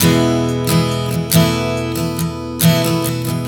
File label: Strum 070 E, One Chord Strumming 070 bpm; Acoustic Guitar; Sonic Foundry (Sony Creative Software); Songwriter Acoustic Guitar Companion